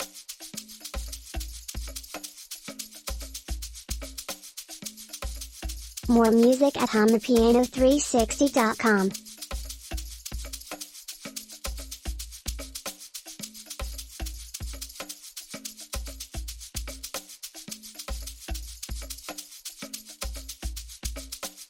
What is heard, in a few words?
amapiano